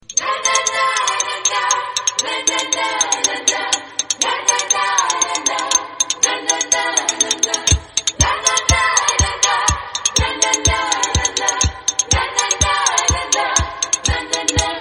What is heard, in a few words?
italian melody ringtone